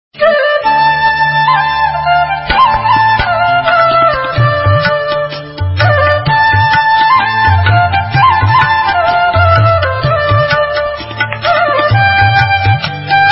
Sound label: indian classical flute instrumental music